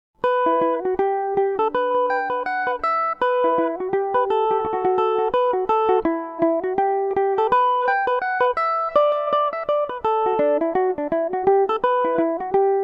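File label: E dorian revelation, irish mandolin; The Golden Keyboard; Revelation Jaguar electric mandolin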